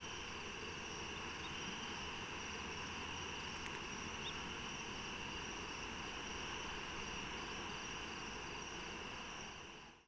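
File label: chatter nocturnal flight call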